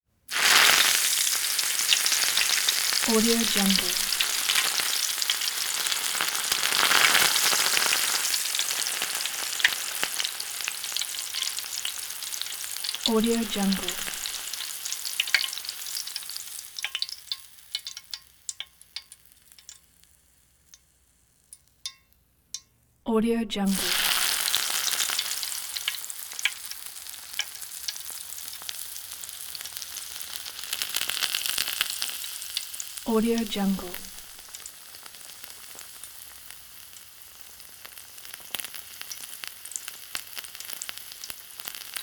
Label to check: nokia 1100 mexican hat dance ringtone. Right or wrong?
Wrong